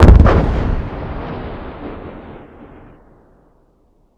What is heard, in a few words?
explosion 40mm distant impact; Explosions; Call of Duty Modern Warfare